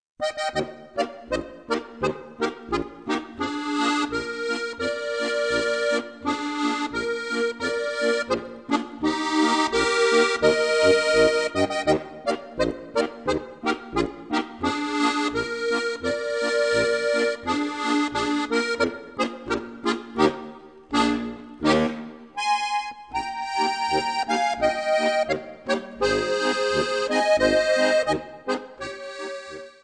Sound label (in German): polka